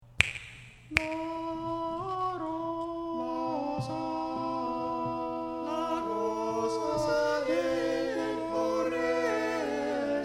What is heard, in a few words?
la rosa basses, chorale